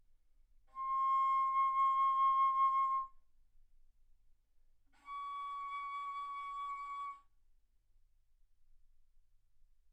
Bass Flute ff C6 Db6

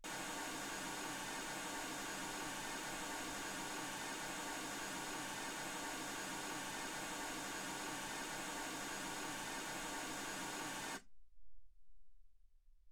mls 48kHz

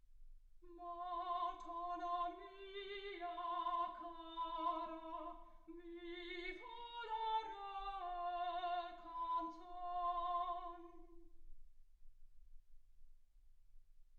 soprano

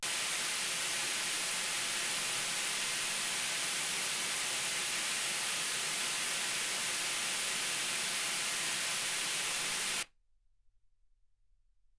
wgn 48kHz

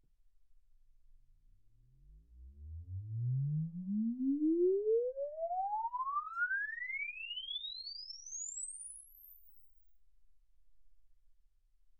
exp swept sinus 10Hz 24kHz

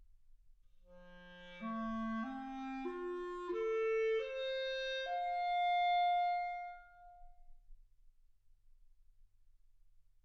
clarinet

